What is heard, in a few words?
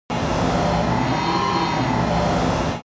sci fi machine giant breathing; human sounds